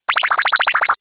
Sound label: Laser Missile; Sanyo SCP-6000